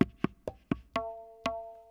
PERCLOOP ETHNO 125; eJay

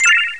bonus; Pharaohs Treasure

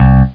DEEP BAS, STRINGS

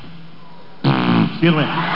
fart

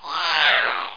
swoop, imp; Hexen II

